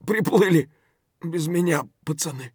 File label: hurt neardeath; Escape from Tarkov